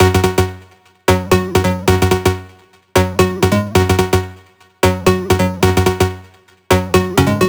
128BPM Big Floor Groove Melody; Vengeance Samples

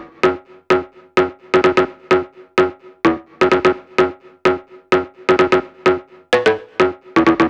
Melody 128 BPM Root F#; Vengeance Samples; Melody Kits

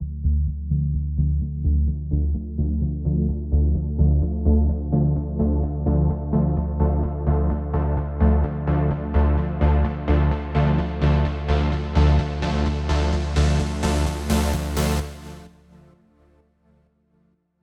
128BPM Chords D, Melody Fills; Deady; 8Bars; Vengeance Samples

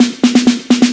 Fill Ins 128BPM; Vengeance Samples

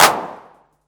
Claps; Vengeance essential club sounds-3

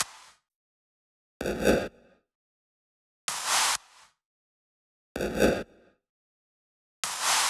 128BPM Kit; Vengeance Samples; Suisse Kit

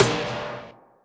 Snare, trap

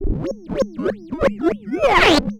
Roland C Noise, Roland S100; musicradar-synth-samples

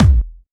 BD Punchy; Vengeance Samples; Bassdrums

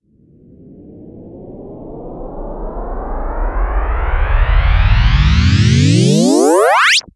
Uplifter

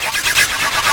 VFX2 Loop 128 BPM

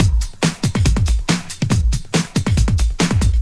Loops BB 140, BreakBeats; Vengeance Samples

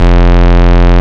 Root F, Bass

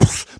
Beatbox Snare, Human Beatbox

